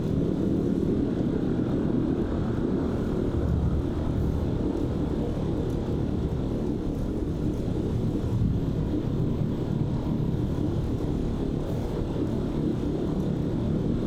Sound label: ship battleship idle; synthqueen; Stellaris; machineage